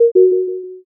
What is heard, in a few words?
error